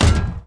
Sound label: step interior; Starsiege